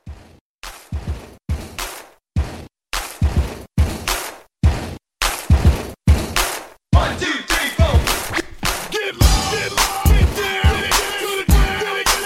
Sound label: Tribute Edit